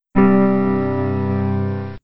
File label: Windows Startup & Shutdown; Windows Never Released Soundpack 2